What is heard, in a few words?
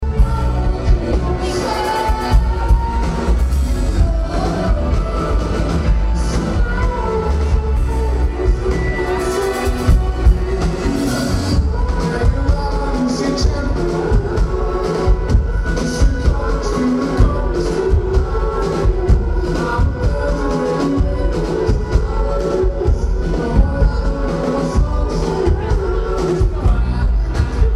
cough cough